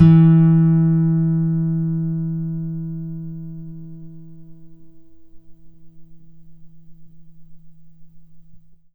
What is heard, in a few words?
NYLON GTR